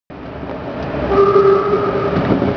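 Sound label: keiteki, train horn; kyoto shiei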